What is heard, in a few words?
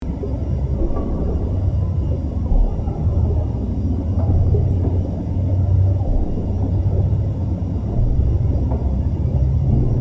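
Ambience Biolab Hall Quad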